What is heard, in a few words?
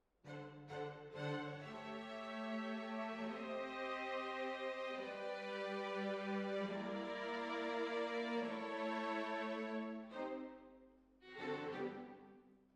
Hall, Jazz for piano